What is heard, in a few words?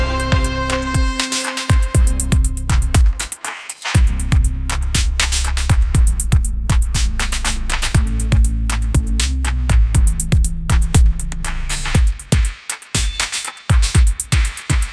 upbeat pop melody, ringtones